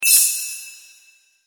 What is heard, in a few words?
bell ok